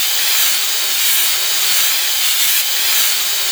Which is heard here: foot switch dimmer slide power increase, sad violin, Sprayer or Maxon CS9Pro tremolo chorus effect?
Sprayer